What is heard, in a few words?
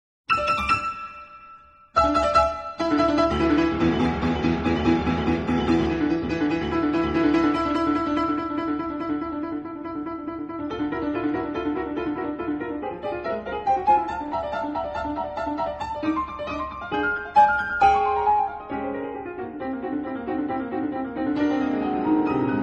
toccata